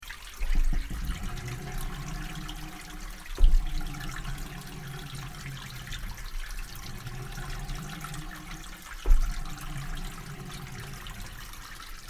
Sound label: Nodar Portugal Sleeping Millstone